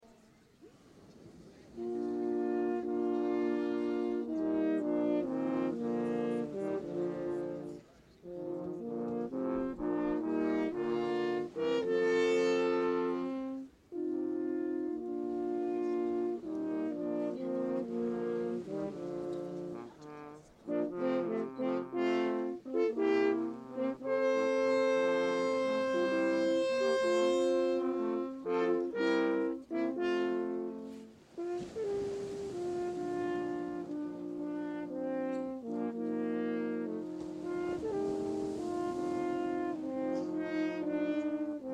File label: Quartet; Quartetto 1